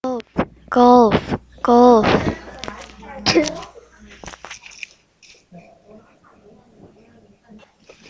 golf

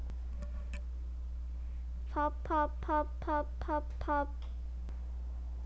pop

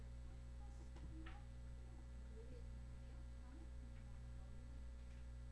gobble

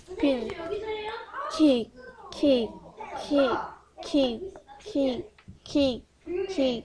kick

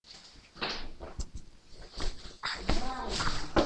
brook

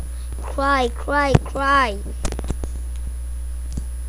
cry

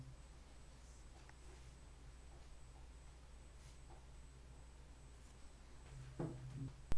bottle

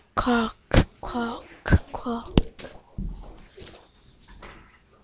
quack

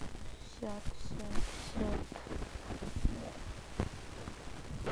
shut

